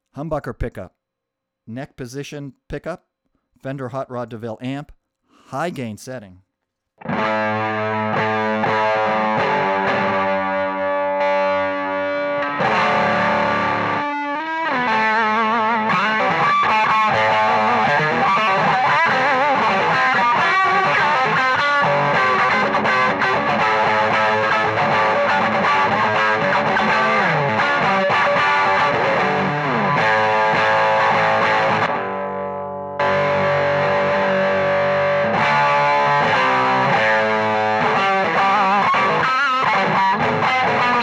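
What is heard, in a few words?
hum neck hot high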